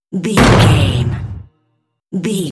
cinematic stab hit trailer

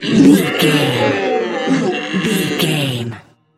troll monster death slow

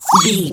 goblin fairy attack fast

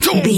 voice male hurt pain

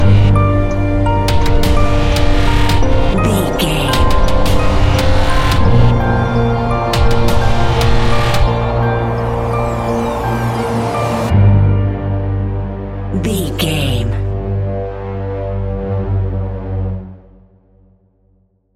echoes modern horror

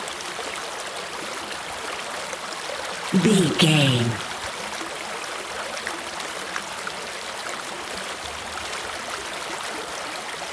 river stream flowing water loop

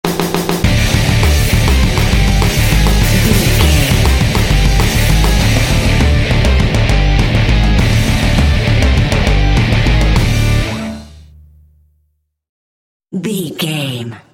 modern heavy rock sting

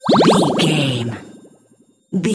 potion bubble effect brew

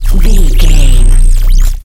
sci-fi electric pulse hum

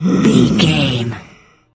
voice female growl groan

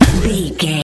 sci-fi shield power deflect block

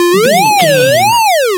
retro synth wobble

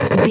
radio tv electronic static

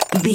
door lock fail

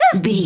voice fun small character emote scared

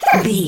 goblin fairy attack low

